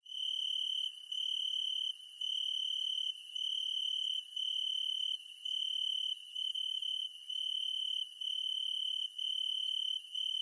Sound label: cricket, ambience